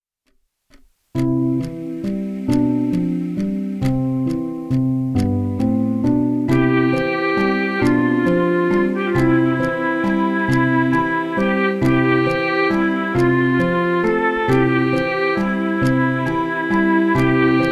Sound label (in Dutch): Waai Waai Doet De Wind; beeldgeluid